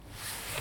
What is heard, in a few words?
Whoosh